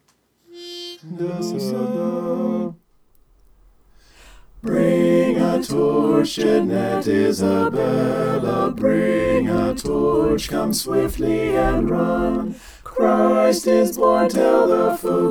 mixed

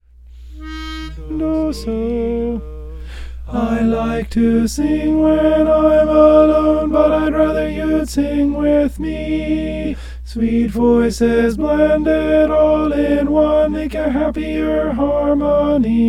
alto

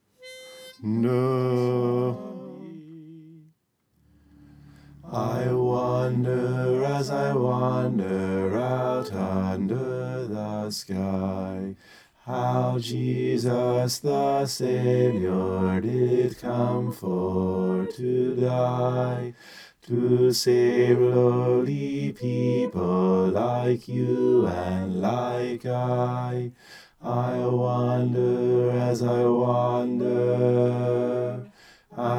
bass

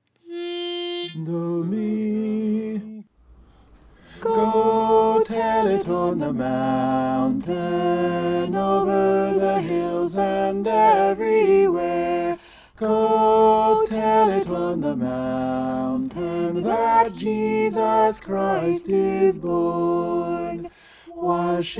soprano

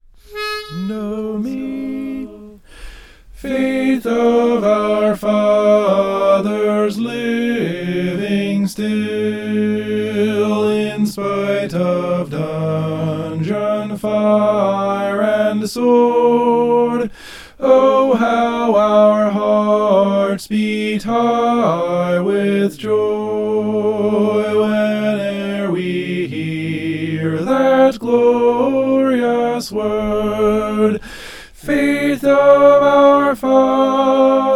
soprano; faith of our fathers

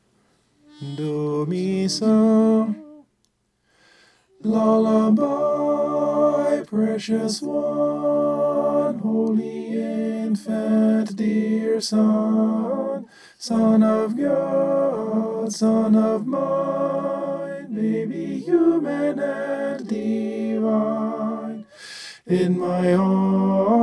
tenor

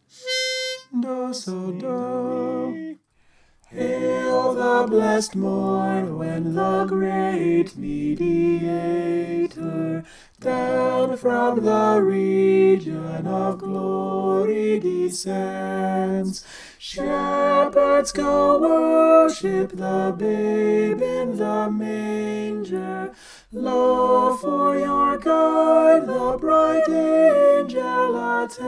hail the blest morn soprano

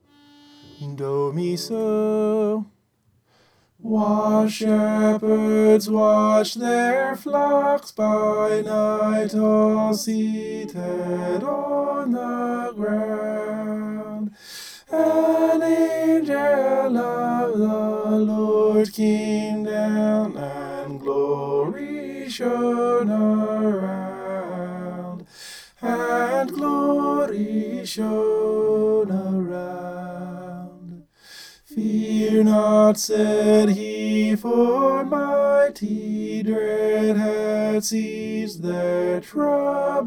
tenor

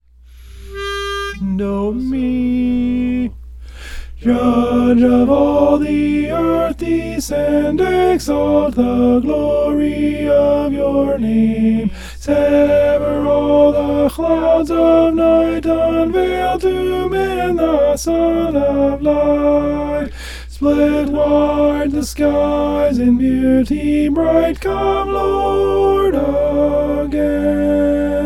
judge of all the alto

